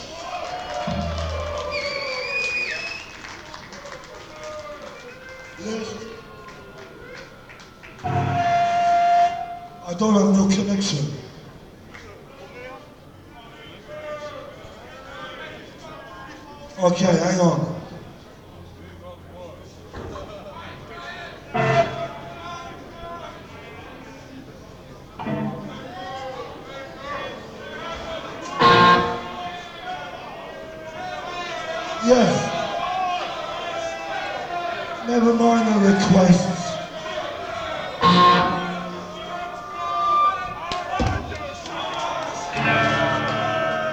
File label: Tuning; De Brakke Grond, Amsterdam